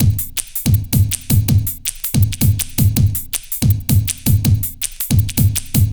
breaks, Loops; M8

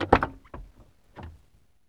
DOOR OPEN, Car; VSI Car

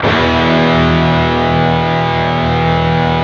PRS, Guitars

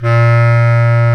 Bs Clarinet, Orchestral Winds; Lo Clarinets; Roland LCDP04

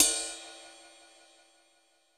ROOM CY RD, ROOM KITS; Crypton; DrumBase5000

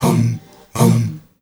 MALE HUM; Spectrasonics; Heart of Africa

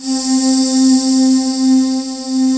breath space C4; FAIRSPACE; Synth

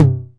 909 TOM, TR-909; Best Service Dance Mega House Dance

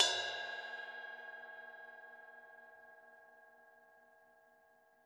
RIDE, Drums Percussion Kit1 Room Mic

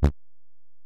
Bell, Alesis DM-PRO

